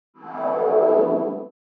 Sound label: Flash Dialing; TransitionsFX; НАБОР DSLR КИНЕМАТОГРАФИСТА